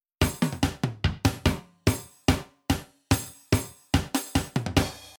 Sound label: Drums